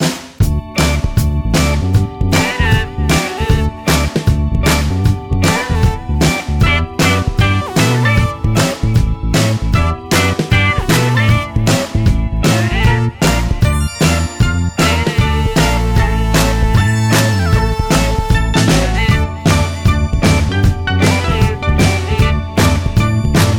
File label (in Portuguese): trilha inteligente; vivo